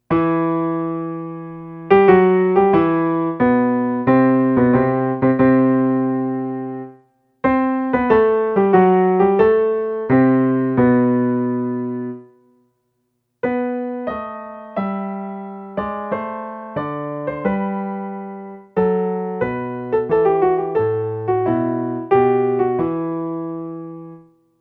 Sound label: Piano Concerto; Piano Concerto No. 4, 2nd Movement